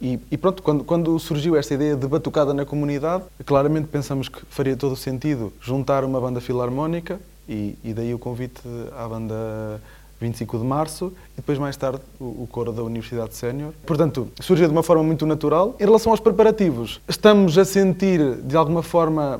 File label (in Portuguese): batucada